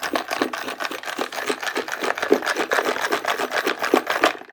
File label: box shaking; Cartoon - film effects